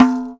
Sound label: Pipe Bam